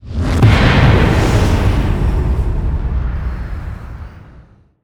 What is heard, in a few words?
Quest Failed; Custom UI Sounds; Elden Ring